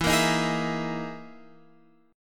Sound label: E Major 7th Flat 5th chord